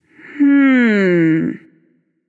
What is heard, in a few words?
NV Thinking; smite; awilix